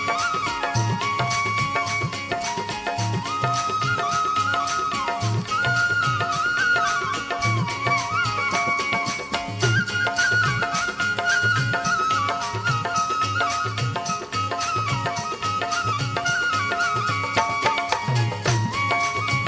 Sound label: instumental